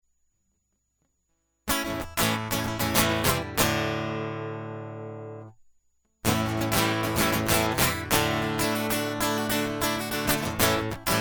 Guitar Loop

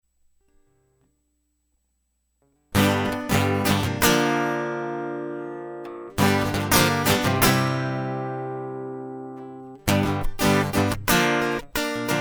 Guitar Loop

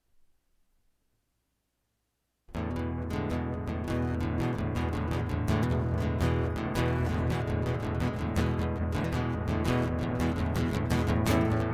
Guitar Loop

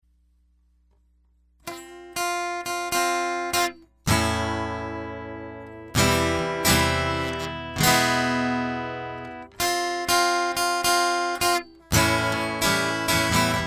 Guitar Loop

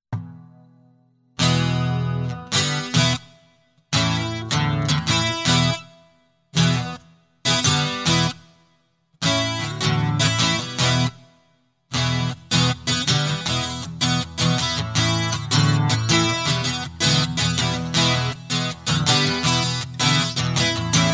Guitar

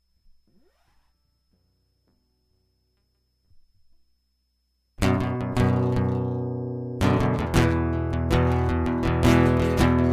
Guitar Prelude

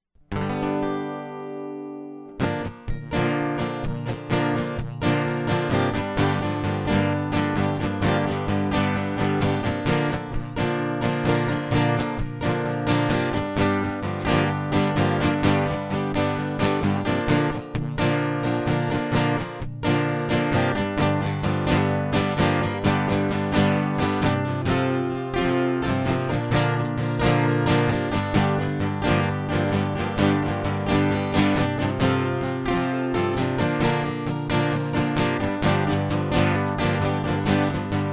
Used Guitar Loop

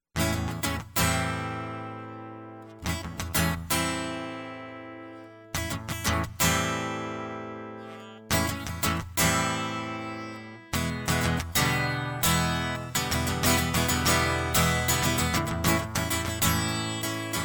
Guitar Loop

